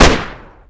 Gunshot

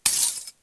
glass step

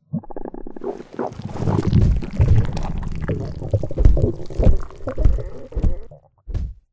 struggle

